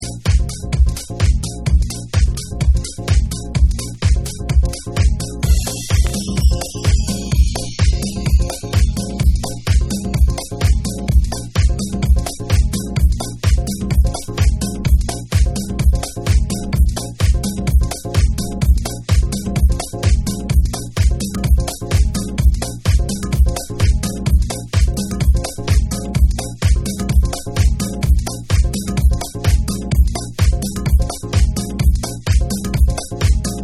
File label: Groove Dub Mix